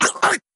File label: damage